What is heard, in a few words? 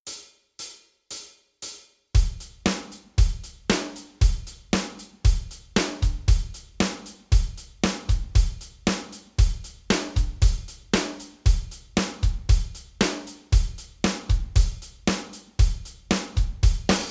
PA4X Rock Kit